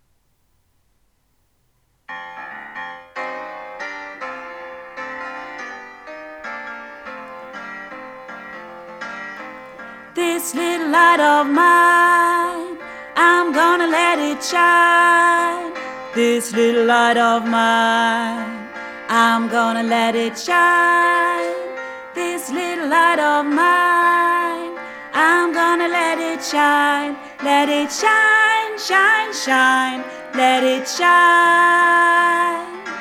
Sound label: This little light of mine - bas